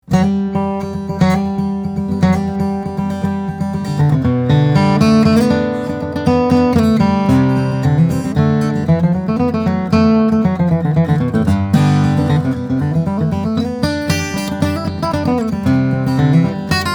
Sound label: Gibson J-50, guitars; 1955; mahogany sitka spruce; steel-string guitars; flattop